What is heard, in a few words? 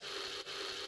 inserter long handed; factorio; Factorio 0.14.21